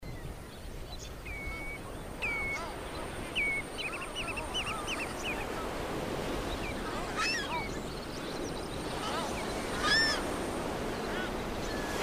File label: Birds on sea shore